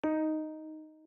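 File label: Instant Messaging Low Priority Message; oxygen sounds; plasma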